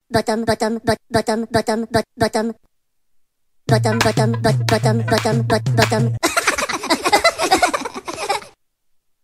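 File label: Sms Minions, sonnerie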